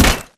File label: bullet light ballistic spectre; Titanfall 2